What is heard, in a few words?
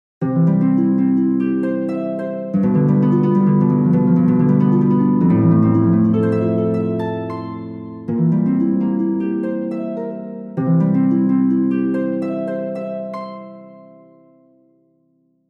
harp, acoustic instruments; logic pro; mainstage